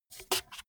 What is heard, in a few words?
bmx bike tire foot scuff medium